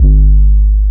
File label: Art 808; Vignette Kit